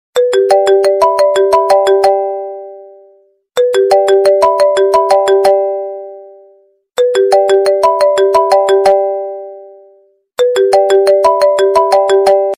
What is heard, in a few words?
iphone 6 song